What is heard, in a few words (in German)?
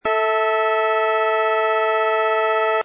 Quinte, Kirnberger Stimmung